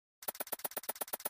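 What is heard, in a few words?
Computer Read Out Fast Repeat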